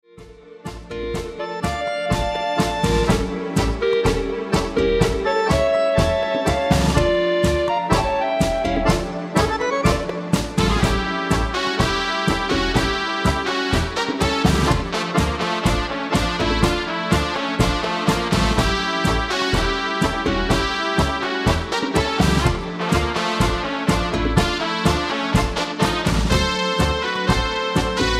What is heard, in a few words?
Tango, Dance